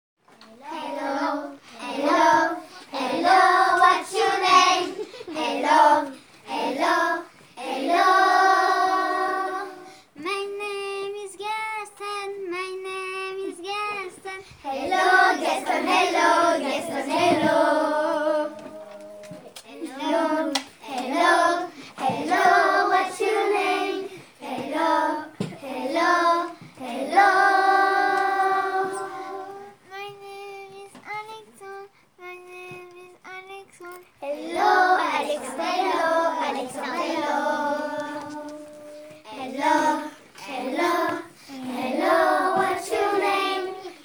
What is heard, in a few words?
Vocal